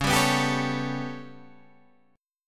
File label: B 7th Suspended 2nd Sharp 5th C sharp